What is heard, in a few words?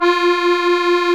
Musete, Accordians, Roland; Club-50 - Foundations